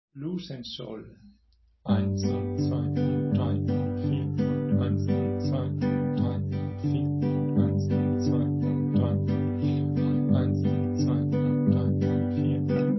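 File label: Blues 12 bar progression in G, blues harp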